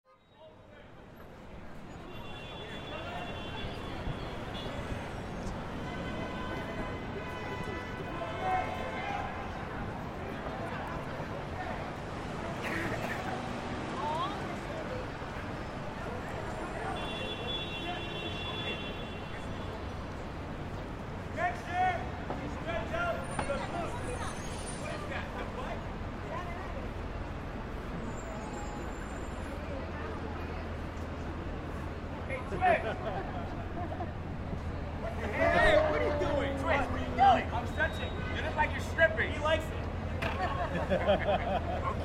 times square street entertainers car beeps crowd noise